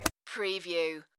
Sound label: Golf Drive